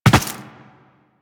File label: Footsteps Land Default; Warframe; Tenno